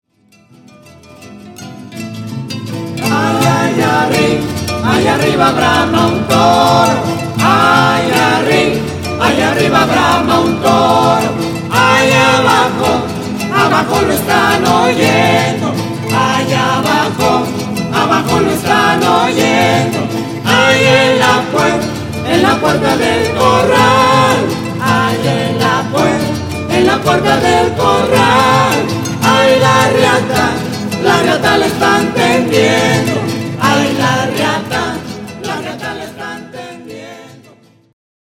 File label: Mariachi